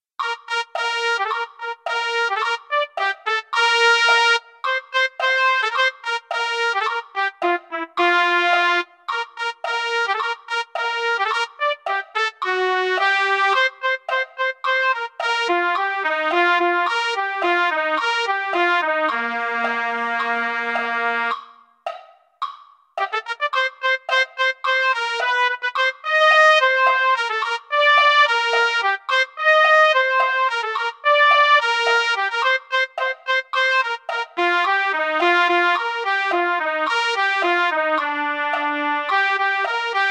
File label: trumpet, brass; holiday brass